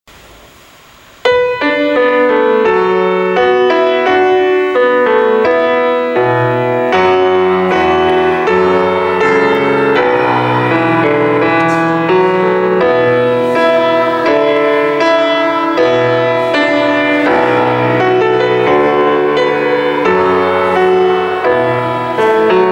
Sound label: piano